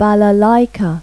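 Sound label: balalaika; Pipo-Aprende musica con Pipo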